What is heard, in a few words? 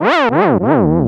Die Spin; Link dying (spinning and dropping SFX); zelda